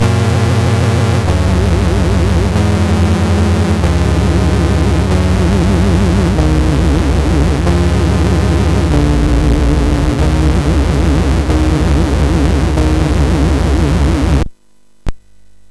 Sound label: Boing, MOS6581R4; hvsc